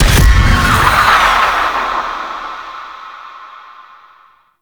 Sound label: Escape Burst; Squad Link; Sentient Condrix